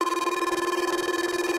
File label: Base Tally Loop; Mahi Gaming